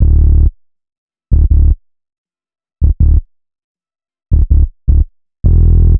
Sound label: HipHop Synthbass Dmaj; Digidesign; Pro Tools Essential Loops